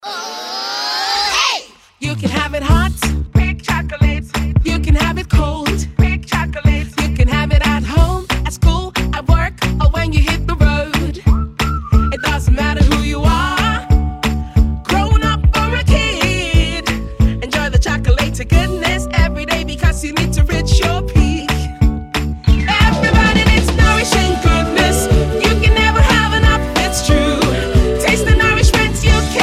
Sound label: Peak Chocolate Jingle